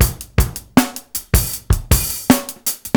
LOOP 79; AMG Gota Yashiki Groove Activator

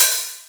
Open Hihat; Best Service; House Definition VOL-1; Cymbal Stuff

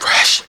Human Perc

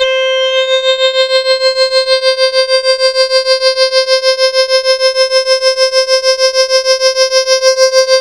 C4, Synth Line; 90 s Sample CDs; NiceBeats; SynthLine VOL-21

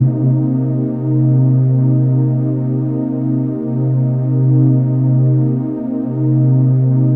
composite, ethereal atmosphere

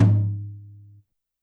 Tom Brush, YAMAHA RM1X

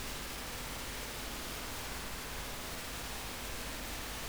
Tape Noise

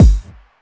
combidrums, techno; single-drums

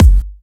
Dubstep Kicks

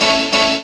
Tip Stab; M8

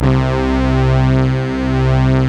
Obie Stack; Optical Media International; Sonic Images Library; Slobie

